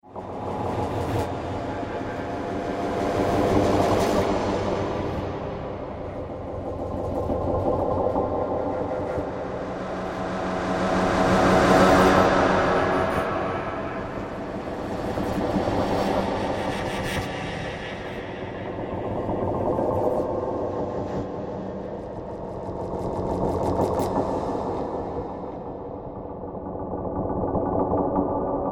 Soundscape Lurking Fear, scary soundscape sound effects